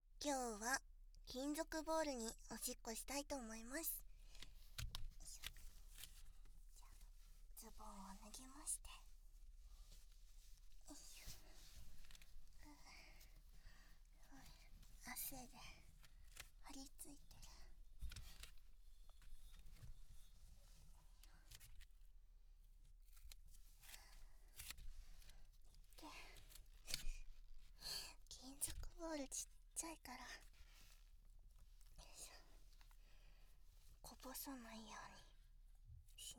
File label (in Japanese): 金属ボウルに！オシッコですッ！(バイノーラル)